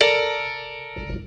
Piano Rough Dmin; Piano & Keys

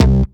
Synth Bass DCO

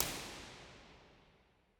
Real Places Cave Art Hall L; Impulse Responses; Ableton Live 12 Lite; Hybrid

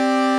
Synth Note Ring Modular

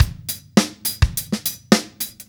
drums; Pro Tracks

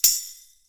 Tambourine Simple; Drums

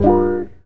Stab Twinkle Gmin

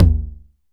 Tom 909 Mid; Drums

